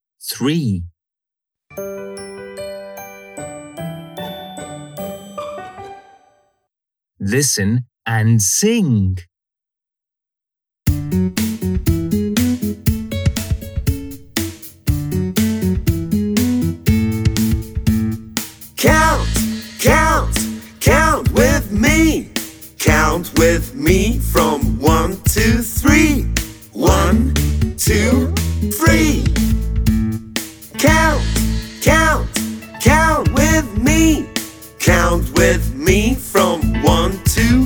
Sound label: count with me chant